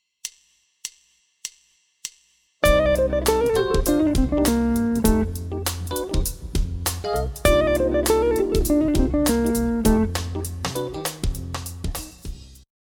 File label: Dorian Lick